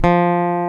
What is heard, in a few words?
Gtr F2; Mo' Phatt; Digital Sound Factory Mo' Phatt